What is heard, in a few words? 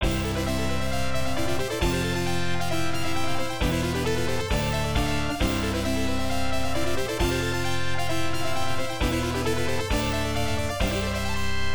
Score Tally; Knights of the Round